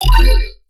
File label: bass hit interface F; Virtual Riot